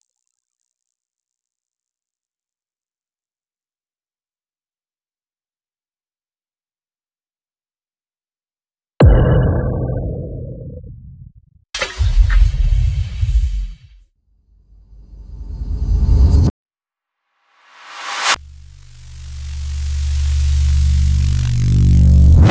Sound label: transition